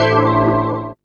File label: DR. LOOP; X-Static Goldmine 3